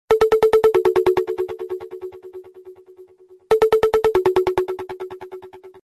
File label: ring